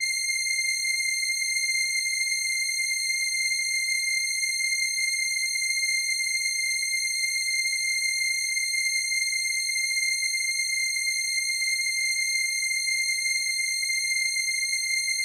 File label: violin C7, ARP Solina